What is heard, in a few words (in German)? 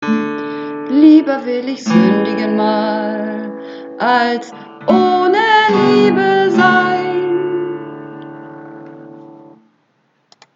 Sopran, Uebungsaufnahmen